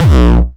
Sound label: 909 Grime A#1, Kicks; Drums; MBase 11 - 909 Grime